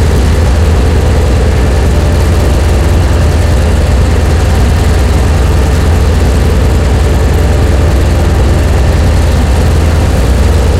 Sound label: hetzer engine int rpm 66 load, tanks; engines; War Thunder